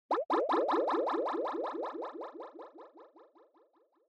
Scene Fantasize Fast

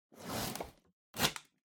Cardboard Box Label Peel Off; ダンボール; ラベル 剥がす; 梱包材

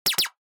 Percussive Accent Timbre; 打楽器アクセント

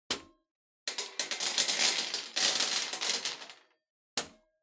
Bingo Machine Ball Put In

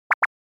Anime Motion High; アニメモーション

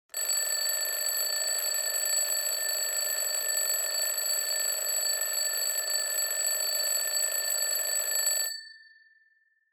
Clock Alarm Mid

